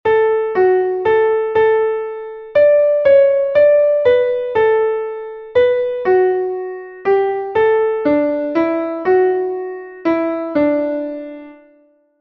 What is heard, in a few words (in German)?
klavier; MelodieMeister